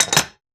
wrench drop

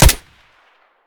Gyrza SD, Guns Fire; Weapons

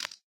arachnid click; Voice Arachnid; civ13; civ14

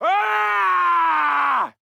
male generic warcry; Voices Emotes

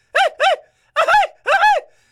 charge, Voices Emotes

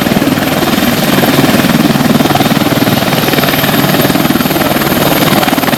ah64 engine, Aircraft; civ13; civ14; Vehicles

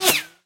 miss; civ13; civ14; Weapons; Guns

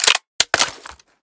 smg mag out, guns

